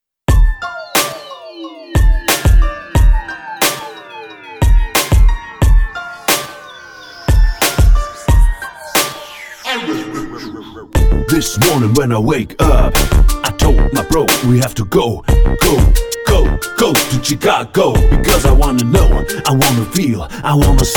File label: HipHop